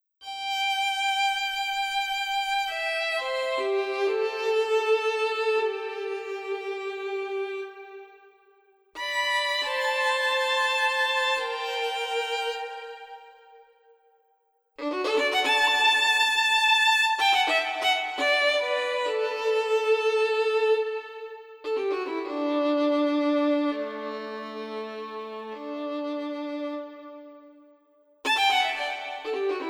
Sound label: Solo Violin EXS Sampler